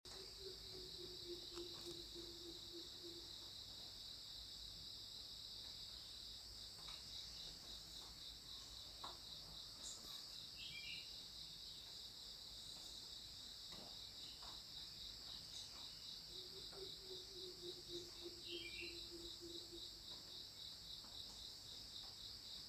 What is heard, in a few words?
Afternoon atmosphere gibbon